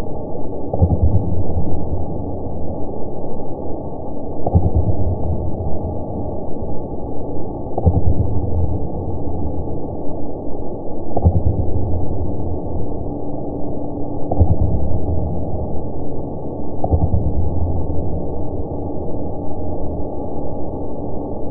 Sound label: airgun